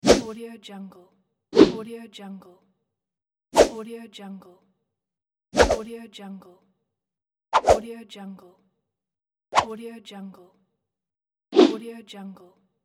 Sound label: fight whoosh fx